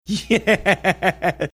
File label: laughing yeah